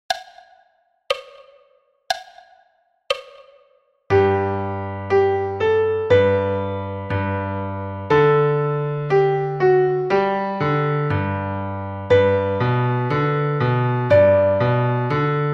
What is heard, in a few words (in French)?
piano solo a 60 bpm clic